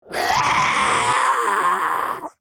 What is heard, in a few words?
Demon beast imp monster growl grunt